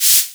Vermona DRM1

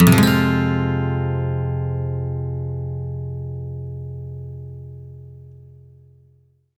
MIN SWEEP F, Electric & Acoustic Guitar; USB Soundscan vol.04; AKAI

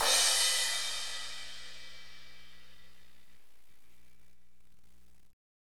cymbal, Roland; Northstar; Drumscapes Roland; Cymbals 1

